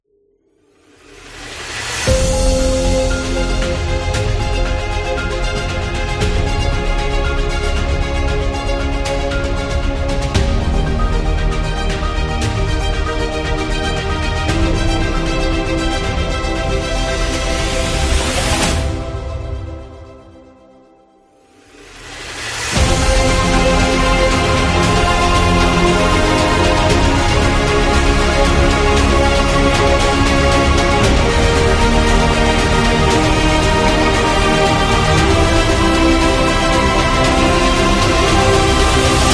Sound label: Inspiring Epic; movie trailer